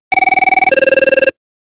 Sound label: ring